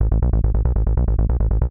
TECHNO BASS 140 A

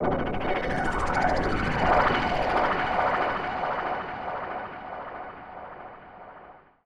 TECHNO FX 140

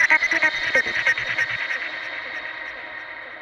techno vocals 140

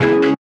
Glitch, One Shots, Glitch FX

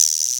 R-8 GUIR; Touch Loops; Vintage Drum Machines - mini pack

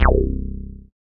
BASS MONO

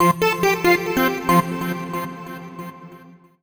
TECHNO SYNTH 140; Keys

